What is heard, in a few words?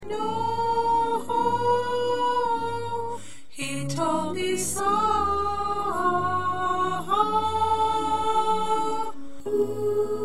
Soprano, choir